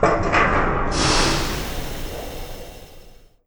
landing; endless sky

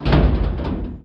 break; rigs of rods

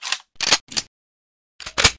sniper reload

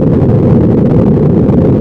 thruster; stunt rally

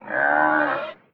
tauroch hit; wesnoth; Under the Burning Suns

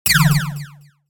beam; manicorn; project-hawk

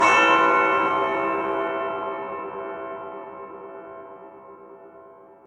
bell; edgar

